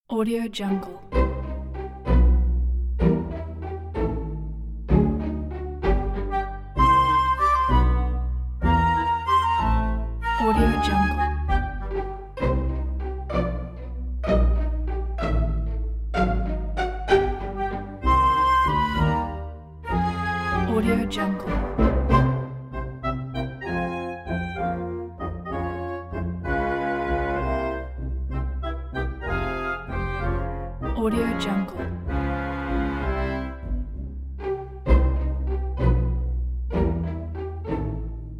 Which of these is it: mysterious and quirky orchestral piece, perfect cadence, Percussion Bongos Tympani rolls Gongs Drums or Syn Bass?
mysterious and quirky orchestral piece